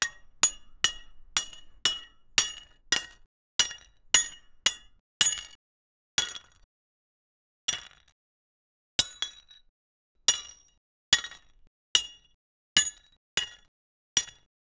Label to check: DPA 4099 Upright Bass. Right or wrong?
Wrong